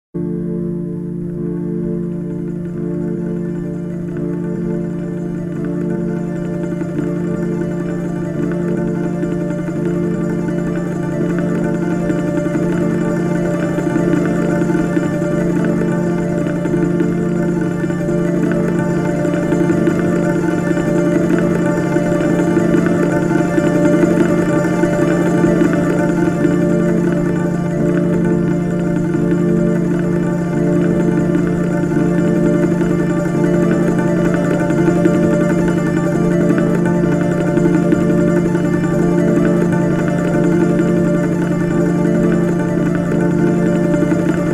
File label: Ronroco Rain loop 230; INDIA IN THE AIR